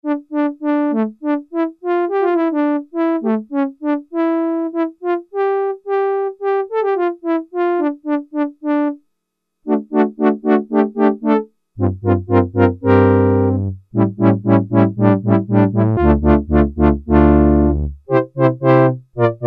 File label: Horn, Roland Juno-60; Factory presets